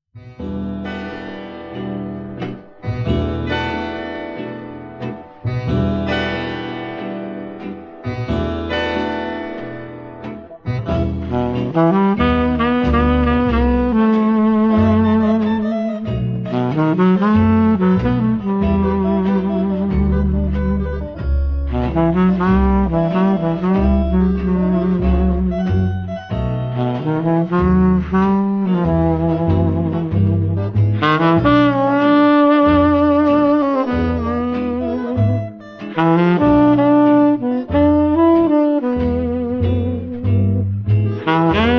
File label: orchestra